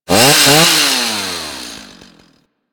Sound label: Ghoul Sawman Rev Ambient Attack; Warframe; Grineer Ghouls